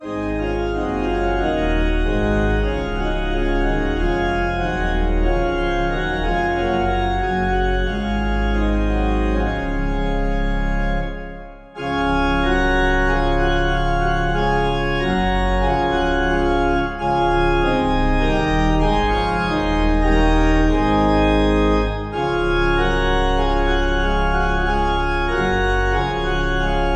Hymn, organ, Savior, like a shepherd lead us, Sicilian Mariners